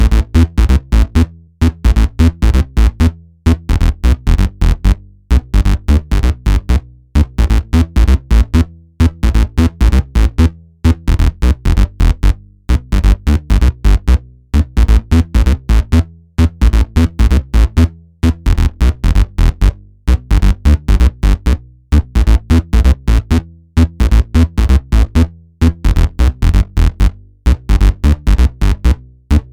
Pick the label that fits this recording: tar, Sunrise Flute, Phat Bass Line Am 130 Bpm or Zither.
Phat Bass Line Am 130 Bpm